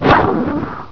FIREBALL; Invaders! 1.2

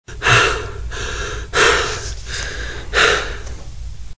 Male Breathing; Survivor The Interactive Game; Player

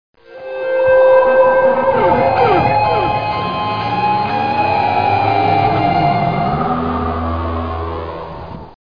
GTR Wind

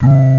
duuu low low; OctaMED SoundStudio V1; instruments

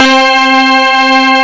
clav organ lead; Epic Collection 3; Epic Marketing

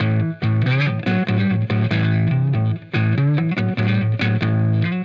Urban Rhythm; Sonic Foundry; Paul Black

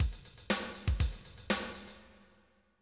rock, drum; Sounds Terrific II

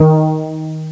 fretless; m1-fretless; 0.10 seconds; Amiga 8-bit Sampled Voice

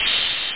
Long Hat; MarcDrive; Mig

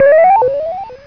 Bonus; Diamonds 3D DEMO

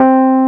Hard EP C3; SoundFont - The Developer's Choice - Volume 1

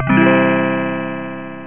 Chord

